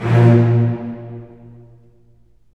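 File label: STR, strings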